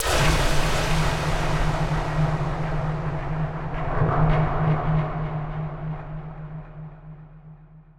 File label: Disturbing Thud